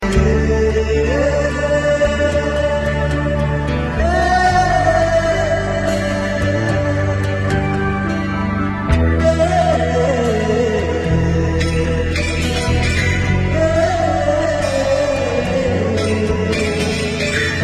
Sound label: Valentine Soft Tone